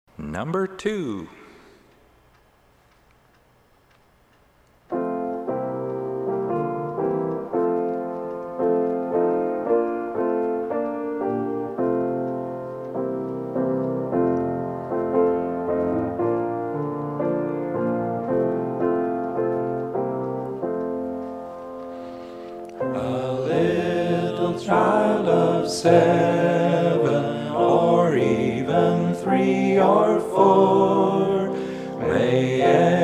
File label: Hymn